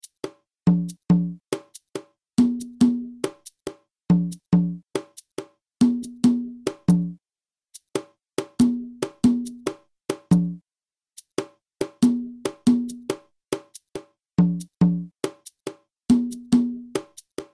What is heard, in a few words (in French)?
guarapachangueo cajon lent; Naissance du Guarapachangueo